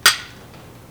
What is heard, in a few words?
wood bell